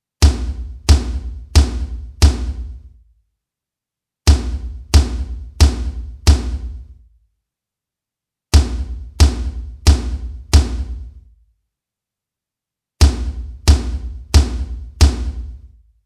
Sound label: Floor Tom 125Hz boost